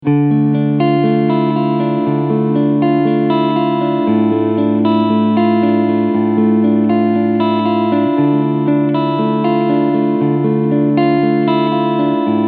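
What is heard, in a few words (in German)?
PRS Custom 24 Floyd Riff Cleansounds alle Schalterpositionen, E-Gitarre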